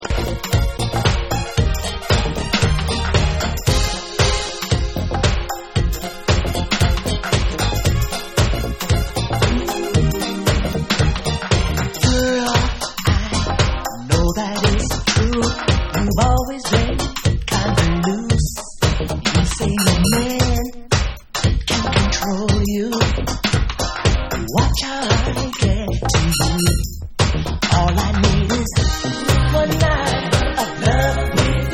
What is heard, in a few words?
Club Vocal